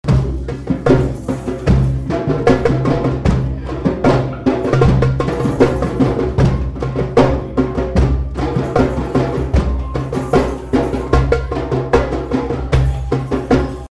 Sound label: Bellydance, drum circle